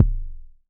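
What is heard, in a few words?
kick, Korg MS-20 Drums; Polyend; Simulacrum Sounds; 1 Shots; Synths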